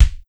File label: Kick